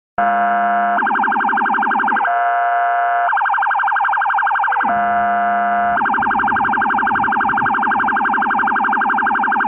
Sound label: Police charger